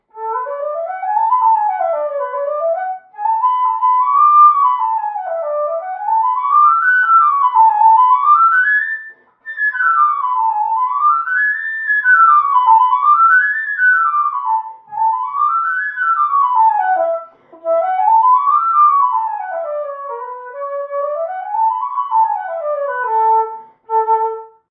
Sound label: Wind Instrument; Assassin's Creed Syndicate